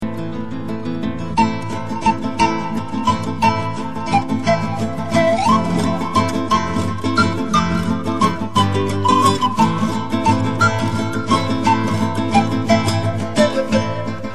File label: piano